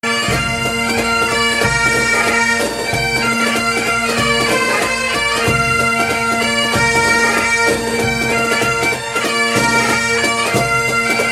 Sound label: Bagpipes